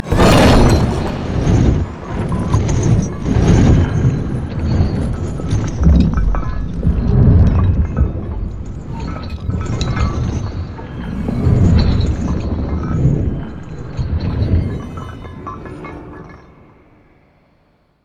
chains fall right; LoL; emitters map12

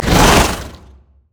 plant enrage; Zyra; LoL

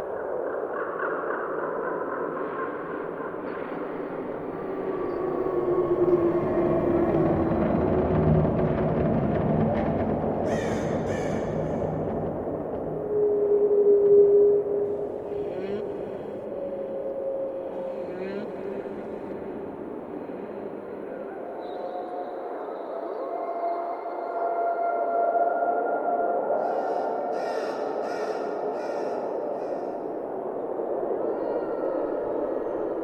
Twisted Treeline Ambience; lol

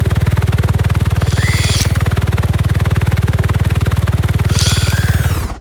hermit robot annoy; LoL; emitters map12

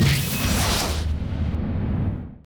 lux lightstrike; LoL

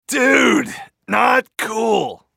dying; Brolaf; Character Voices - English

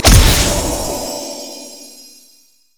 pick a card blue card; LoL; twistedfate

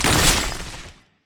shatter; lissandra skin01

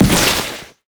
frost flamebreath; LoL; shyvana

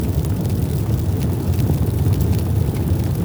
fire big; emitters global

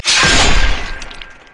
crit attack; LoL; yasuo base

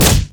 monkeyking nimbus kick; LoL

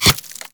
frostshot; LoL; ashe

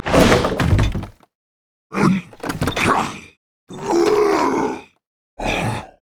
recall lead in; renekton skin06

